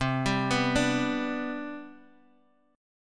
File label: C Major 7th Suspended 2nd, C, down, Guitar, DADGAD